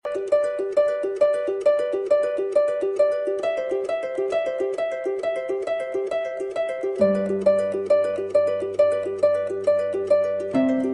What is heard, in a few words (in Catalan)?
guitarro aniversari